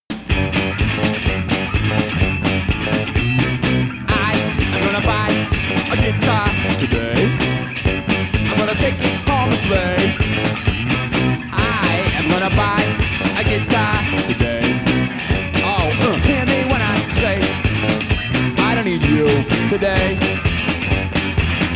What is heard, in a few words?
Guitar